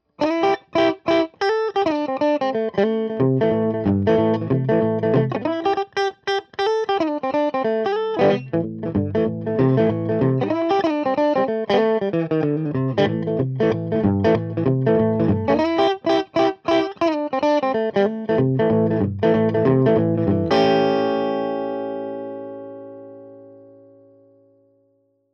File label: Strat Sag; TubeUlent